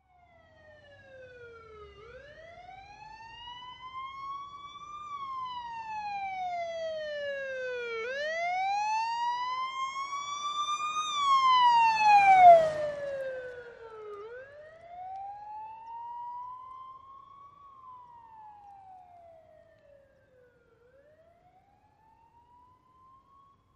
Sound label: Police Wailer Siren In From Distant, By Left To Right Fast, And Away To Distant. Off At Tail.